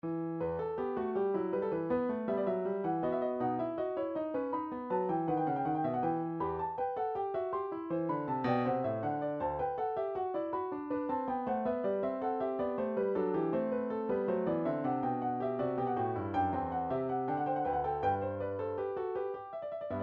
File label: piano, midi